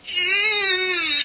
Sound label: ugggggggggghhhhhhhhhhhhnnnnnnnnnnnnnnnn